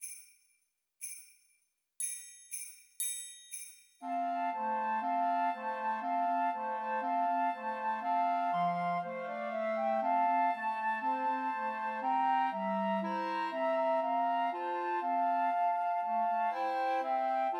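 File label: Christmas Trios for Two Flutes and Clarinet; Jingle Bells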